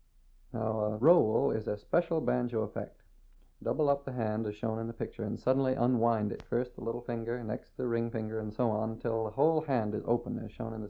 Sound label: Roll, 5-String Banjo; How To Play the 5-String Banjo